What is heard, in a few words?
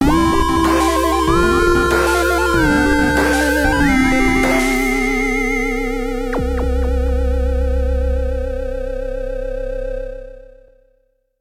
You Win; Orion Trail